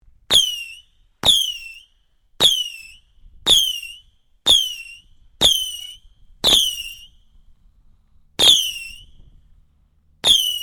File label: Fireworks Multi Launcher Screamers, Variety of fireworks being detonated